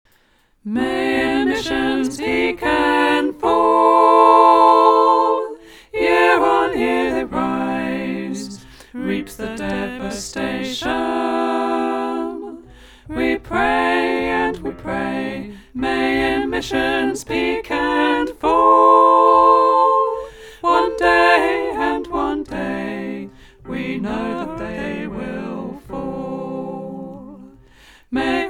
SATB; All Parts

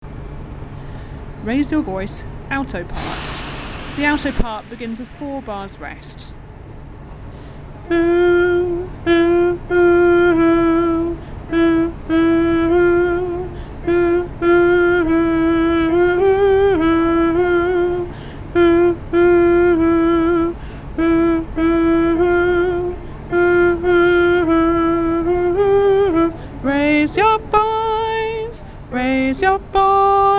alto

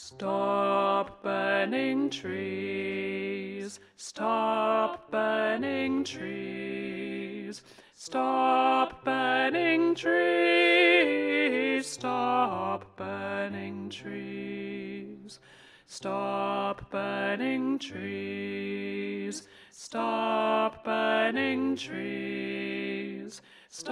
tenor

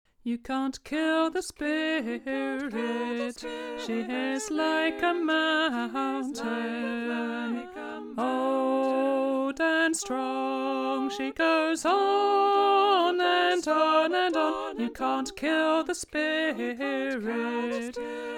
Polyphony voice

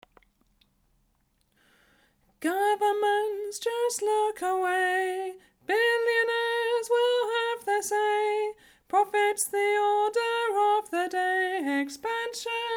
soprano

